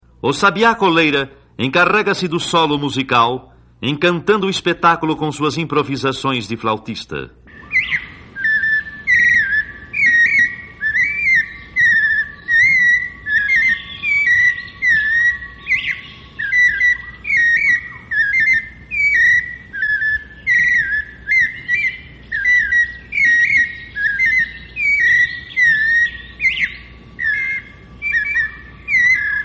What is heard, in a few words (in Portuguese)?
canto, cantos de aves; johan dalgas frisch